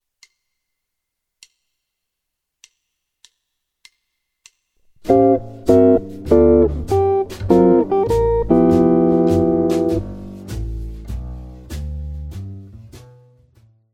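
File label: lick, guitar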